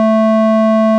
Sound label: pseudo sqr